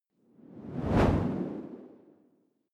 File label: whoosh simple hard